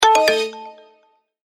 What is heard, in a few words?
cancel